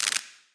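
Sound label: electricity; smite; kuzenbo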